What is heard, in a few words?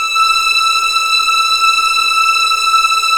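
E6 legato F R, string; East West; The Ultimate String Collection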